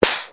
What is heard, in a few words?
SD, vermona drm1